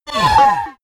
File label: sci-fi laser shot long